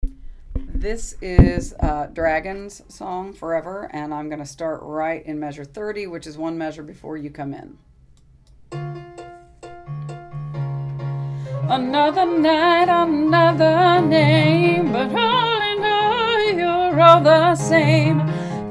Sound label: dragon music example